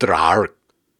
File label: Syllables and Imaginary Words, Viking Vocal, Hard; Viking Vocal Builder